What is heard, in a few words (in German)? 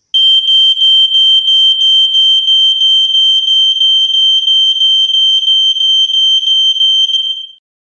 Alarmton, rauchmelder